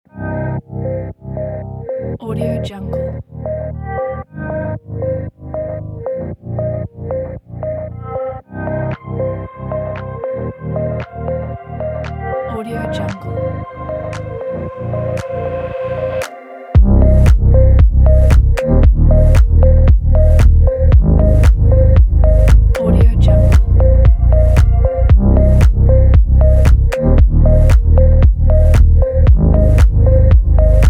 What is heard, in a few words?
ambient abstract